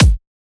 kick

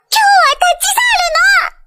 fail sound

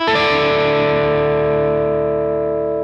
Arpeggio down

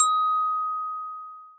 D#6 bell

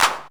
Clap Reverb; osu

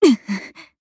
combo break

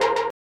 synth; osu

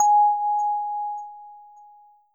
end chime

